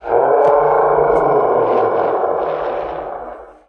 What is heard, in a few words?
pain; blade